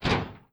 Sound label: DOOR Metal Door Close mono; adams venture origins